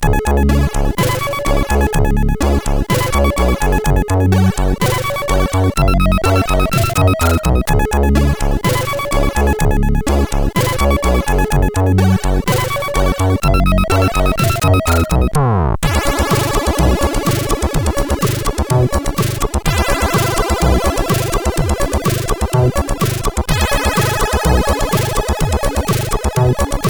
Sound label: Plasmachaos, CSG8580R5